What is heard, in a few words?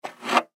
Jewelry Ring Up, Ring Pick Up Scrape; Objects; Foley; Blastwave